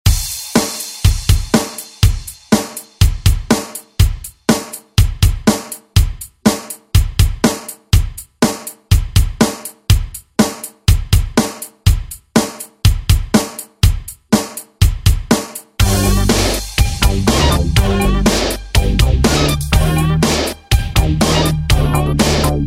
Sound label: 80s ReDrum; DJ Mhark